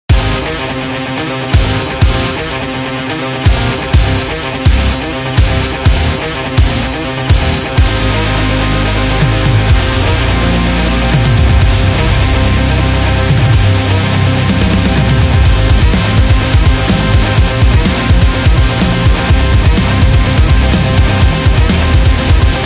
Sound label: Rock Commercial